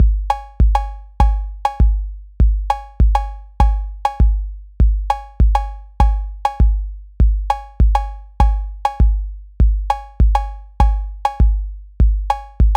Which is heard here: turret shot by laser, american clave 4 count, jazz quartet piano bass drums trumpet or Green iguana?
american clave 4 count